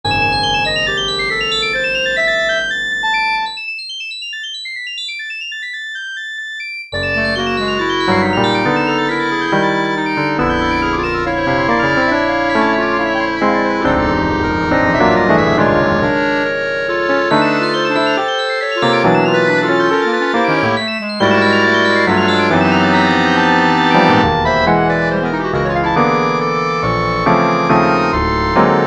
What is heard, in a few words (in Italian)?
musica dodecafonica